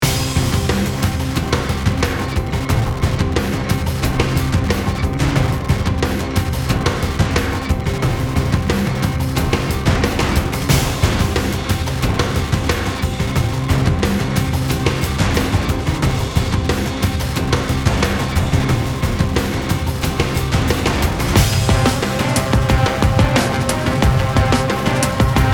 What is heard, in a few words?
Seaport Warehouse Music